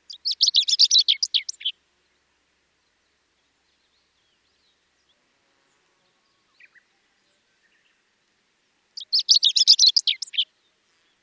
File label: hooaka; ホオアカ; さえずり